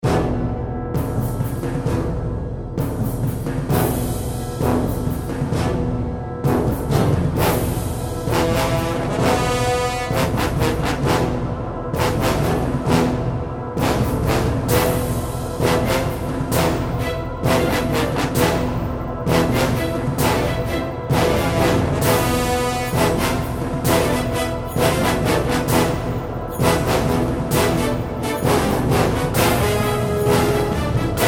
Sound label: Battle; kult